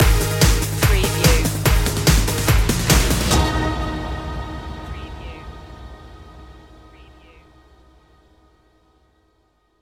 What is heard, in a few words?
fresh energy stinger